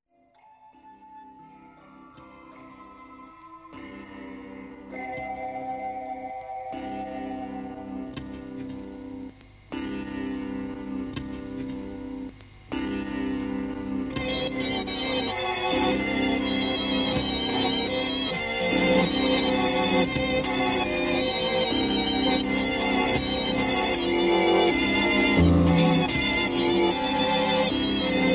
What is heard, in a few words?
Mellotron Music, sound experiment; Mellotron Music No.4